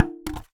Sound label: Polystyrene Rattle